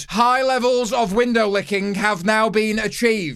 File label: window